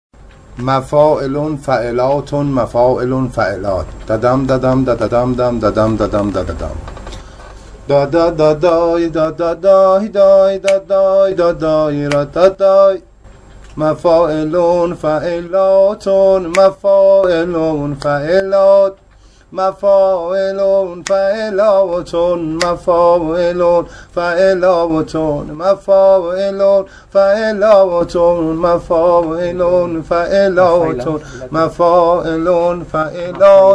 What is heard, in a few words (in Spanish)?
kereshmeh metro ritmo; Musica persa; nava